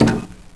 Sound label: player ladder